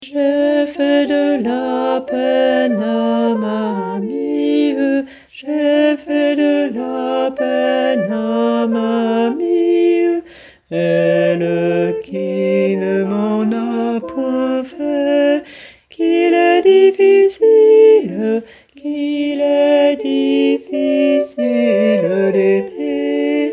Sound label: Tenors Basses; Le Doux Chagrin